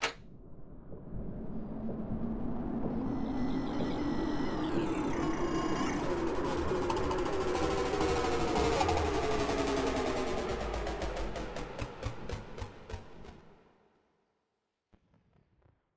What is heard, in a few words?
Next Level 2D; MiSide